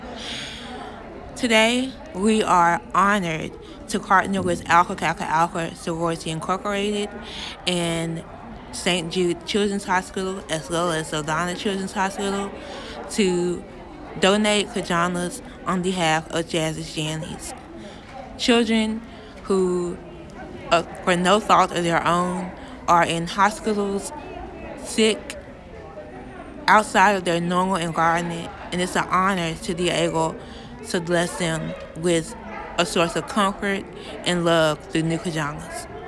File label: Nu Jazz